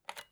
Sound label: flashlight; Laser Pointer